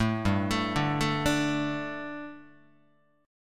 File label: G Suspended 2nd G, down, Guitar; Gsus2 arpeggiated; JGuitar